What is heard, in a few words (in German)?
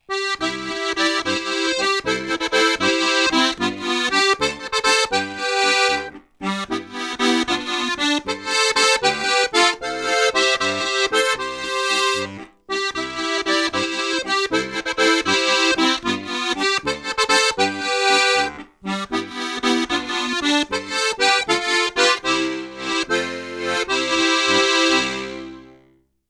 Tremolo wenig flach, steirische harmonika